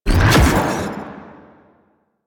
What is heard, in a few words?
Pulse Cast; DE; Warframe; Warframes; Gyre